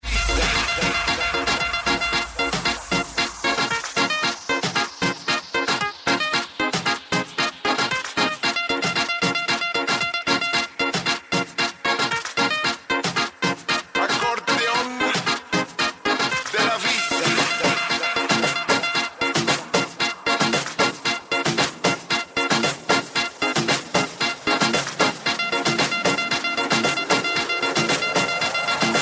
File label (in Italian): Arcordeon